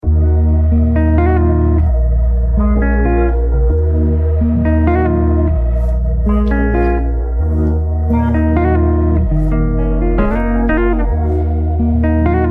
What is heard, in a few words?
Instrumental